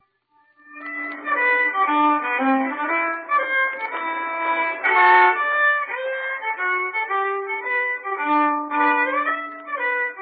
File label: German Waltz